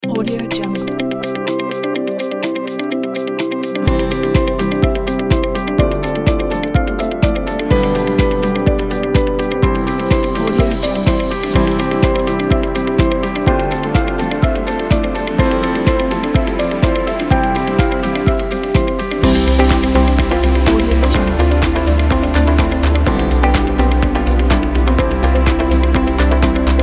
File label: piano inspirational motivation kit